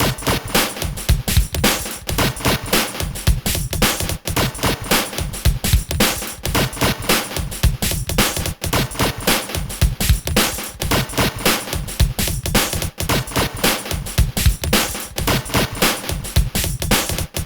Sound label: Cool Beat; Deltarune OST